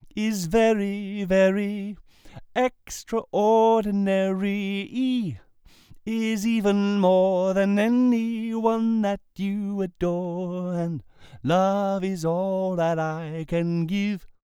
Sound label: On Axis Tone Figure 8; Recording Mistakes